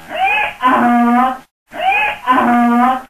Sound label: donkey; animals; mammals; stamps